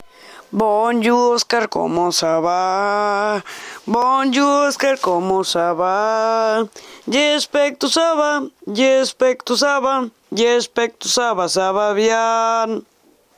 cancion frances

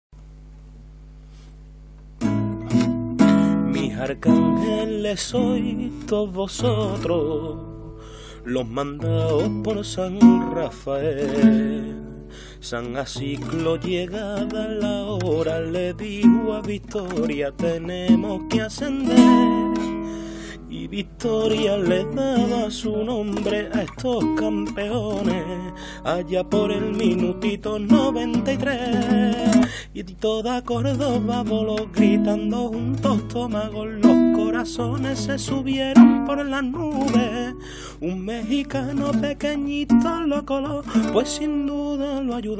pasodoble; al cordoba cf

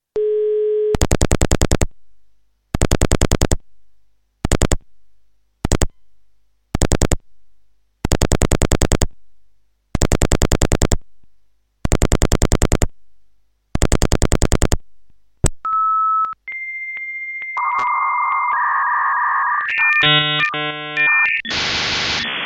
modem marcando con pulsos

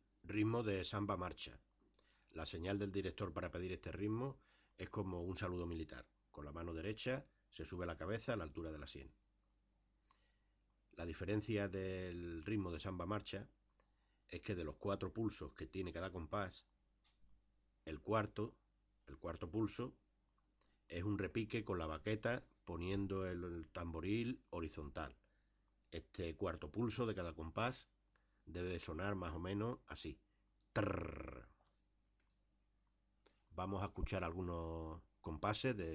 ritmo samba marcha

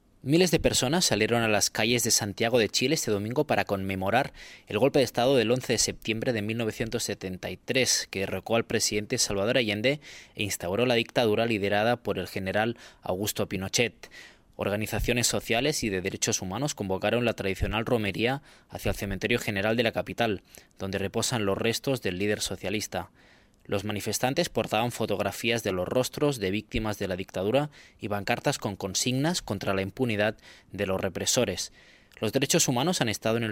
varios miles de personas salieron, Santiago de Chile; golpe militar

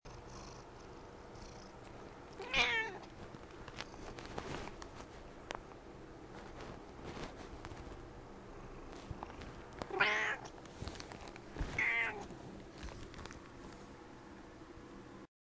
gato

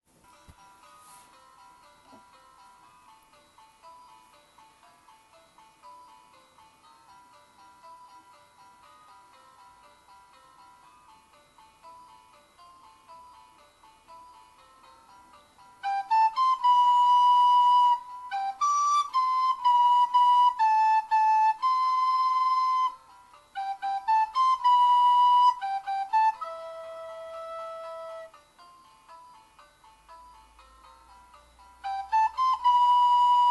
amb flauta

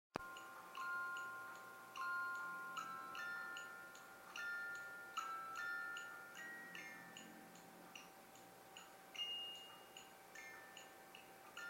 musiques de fondo

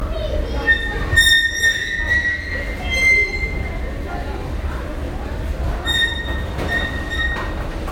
escalera oxidada musical

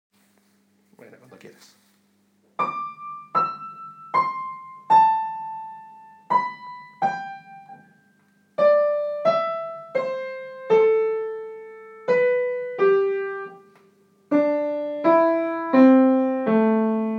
musica

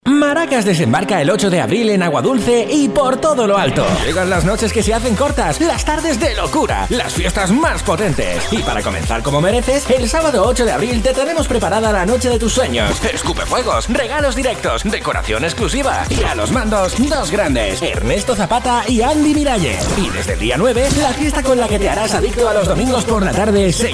audio promocion maracas

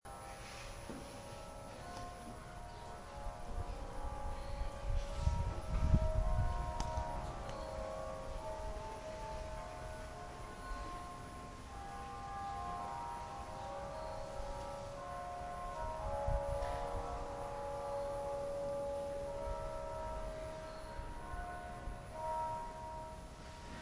ambiente asturiano campanas

